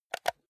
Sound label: console controller home press fast